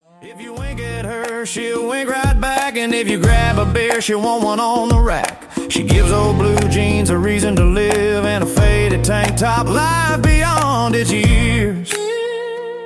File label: Country